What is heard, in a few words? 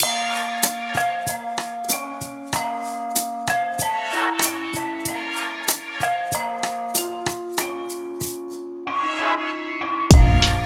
Corporate Jingle